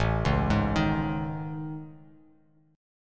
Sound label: A Major Sharp 11th, Bass Standard; AM#11; arpeggiated; JGuitar